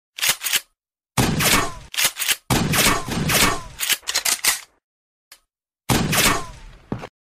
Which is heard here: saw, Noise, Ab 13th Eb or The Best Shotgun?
The Best Shotgun